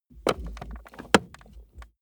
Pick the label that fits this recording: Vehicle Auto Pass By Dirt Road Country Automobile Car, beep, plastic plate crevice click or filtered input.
plastic plate crevice click